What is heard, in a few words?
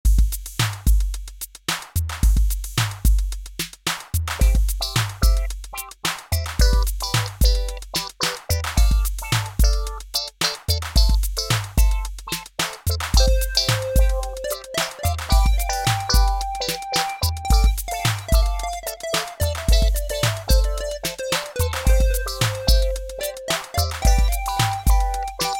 80s Electronica